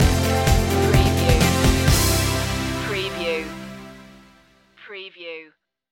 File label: lexicon stinger